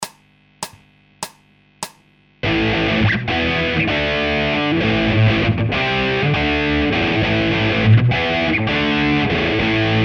Hard Guitar Loops

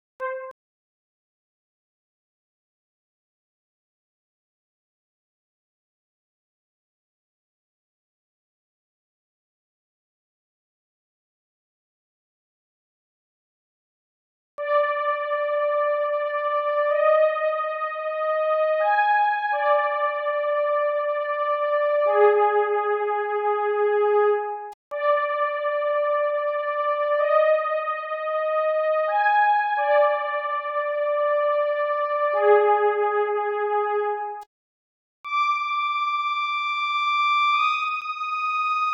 synth

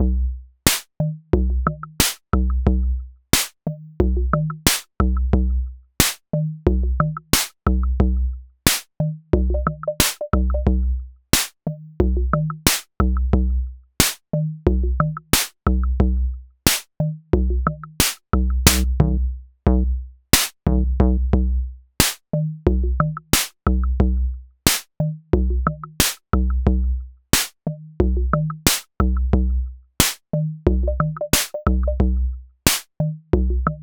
90 Drums

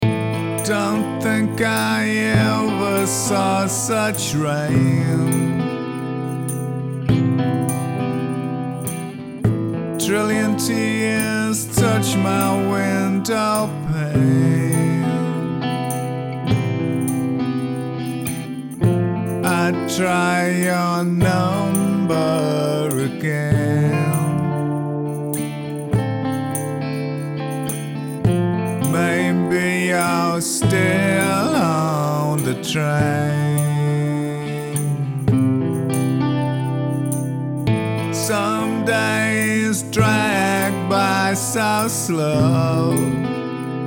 Blues

